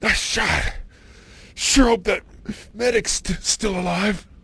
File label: hurt; alley; sniper; hl2